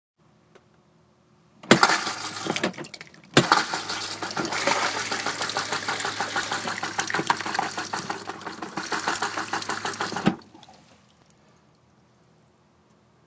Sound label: toiletsound